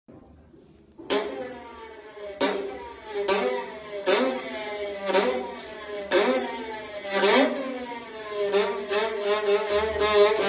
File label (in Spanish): moto con violin